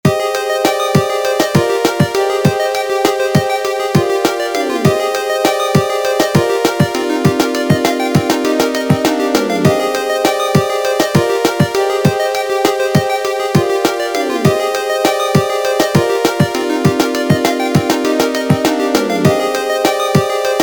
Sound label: game Over pixel